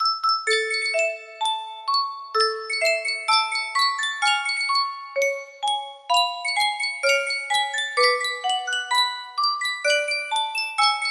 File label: Sankyo Music Box, music box melody